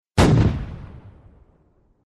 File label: cannon Ball; Tower Crash